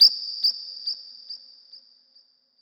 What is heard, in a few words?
Birds, Beeps; SFX